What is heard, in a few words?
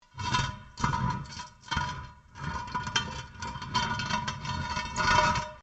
METAL ON STONE LARGE SCRAPE; Materials